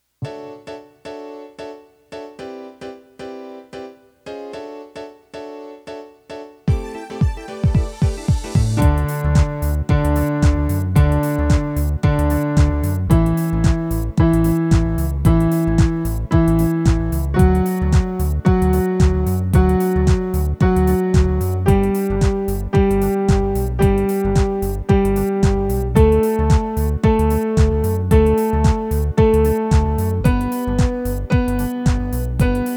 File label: Climbing the D Scale